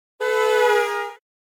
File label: Car Horn Doppler By - Short Passing Car Horn - R to L; Hollywood Edge; sound designer's toolkit v2